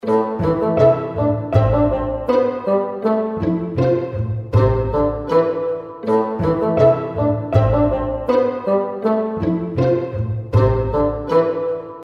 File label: sample bgm